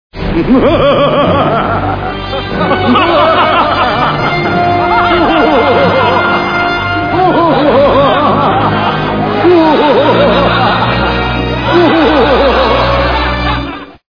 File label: evil laff